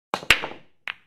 Billiard open; Nokia; 7376

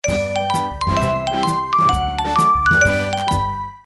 message alert

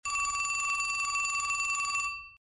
Telephone Ring, ring tones; LG GD910

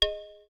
Volume, ui; Asus Zenfone-10

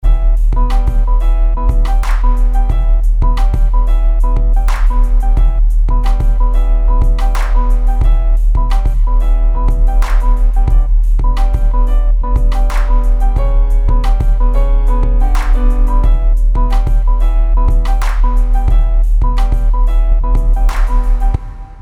RING LAIDBACK; HTC; Ring Tone; Stereo; E-Club Ring Tone Package 1

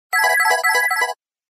Serene tone; Samsung; SGH-F270

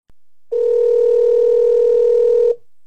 Ringback; LG U8500

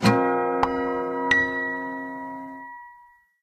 Harmonics, notifications; Sony Xperia X

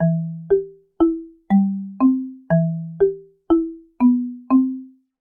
Marimba

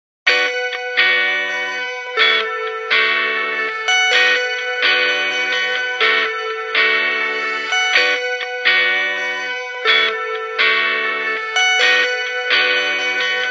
Nokia Ringtones; 7210 Supernova

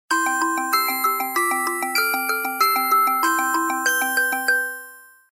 Chiming tone; Samsung SGH-i400

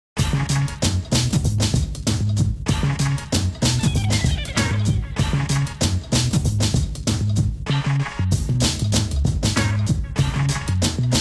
Hiphop background music

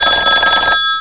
Classic, Cisco Unified IP Phone 7945G

